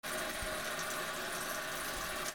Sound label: BATHROOM